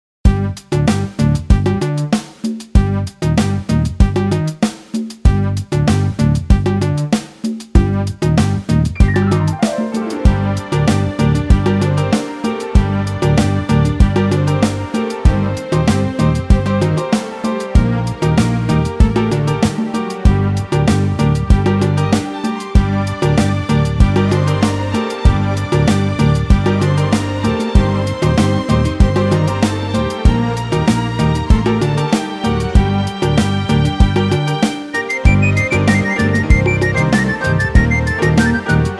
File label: Bikalam For Male